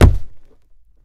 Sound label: Barrel Hit Single; punches kicks hits bones